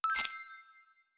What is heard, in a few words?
Special & Powerup; ArmyRaid; Interface and Item Sounds